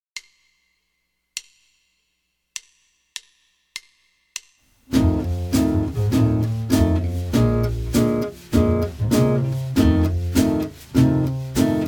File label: F Major Root 6, jazz guitar